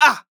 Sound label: MALE VOICE DEFENSE SHOUT - Defensive Block or Damaged Hurt Submissive Short Interjection; Yell